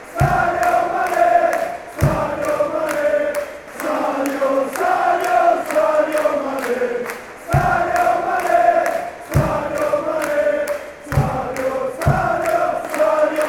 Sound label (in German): mit Klatschen; sadio mane